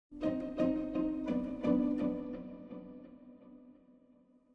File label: MUSIC pizz; Myst III Exile